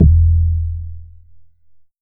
disco, bass analog & synth; DISCOVERY; USB Soundscan vol.12; AKAI

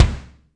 BONUS BD, Drums

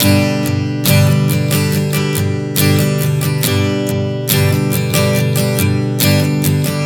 Strum 070 G, One Chord Strumming 070 bpm; Sonic Foundry (Sony Creative Software); Songwriter Acoustic Guitar Companion

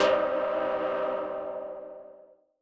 M Dynamic Tech C3; KG MegaSaur

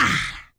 VOX, VOCALS; Ueberschall; Jam Box

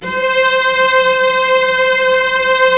STR VC3 mf

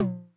Tom Hi Accent High Tone Mid Decay, 909

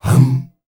hum hum, S. African world voices

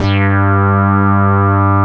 Synth Bass, Analog Bs

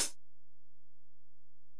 HiHat, Alesis DM-PRO

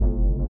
LIQUITAR; Spectrasonics; Metamorphosis

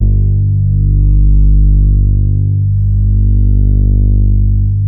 MOOG BASS G2; Best Service Dream Experience

